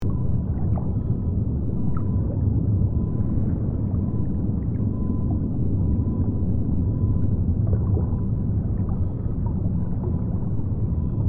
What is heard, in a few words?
Submarine traveling underwater perspective; vehicle